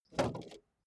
car bmw e46 exterior bonnet unlock

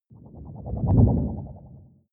whoosh storm wobble hard short

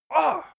male hit soft; menschen; schreie

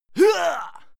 male fight attack hit; soundpool

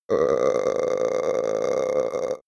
male die growl weak; koerpergeraeusche; menschen; soundpool

